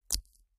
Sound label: finger break, body